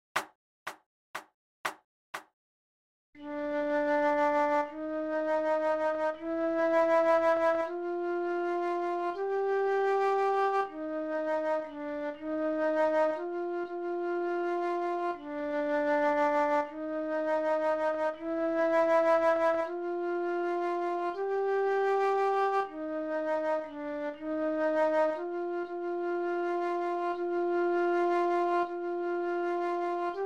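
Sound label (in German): Floete, 40 bpm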